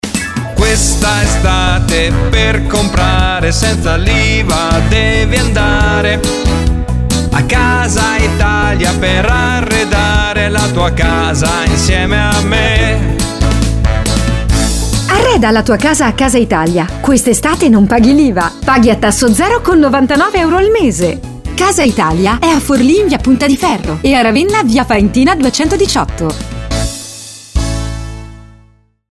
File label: jingle; casaitalia